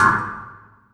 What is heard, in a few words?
Vinyl Oneshot; Sonic Foundry (Sony Creative Software); Esoterik Beatz; Vinyl101